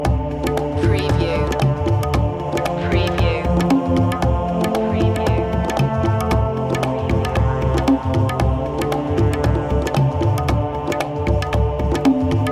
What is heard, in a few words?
world pulse loop